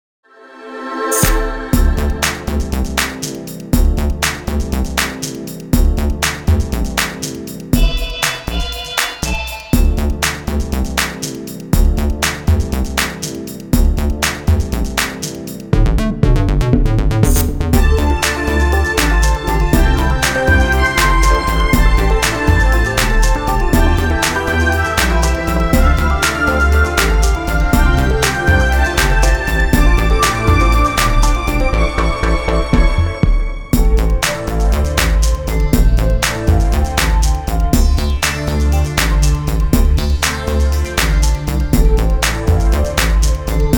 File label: offvocal; filtering